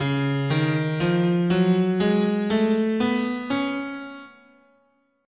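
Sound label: D flat Fifth Mode, guitar, scale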